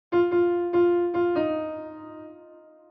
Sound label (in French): voix